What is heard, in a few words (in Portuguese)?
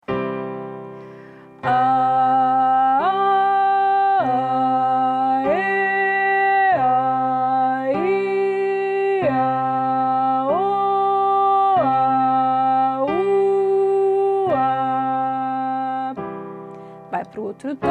Exercicio isotonia muscular vocal